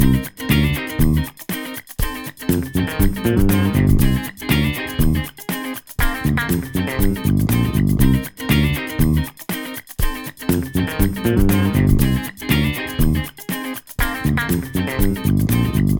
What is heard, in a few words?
Playing Loop; Nope Quiz